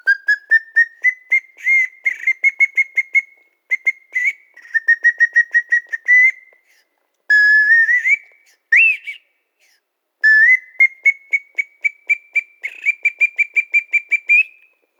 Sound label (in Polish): gwizdek hi tone; peruart